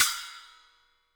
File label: HiHat & Crash, Roland TD-20; HiHats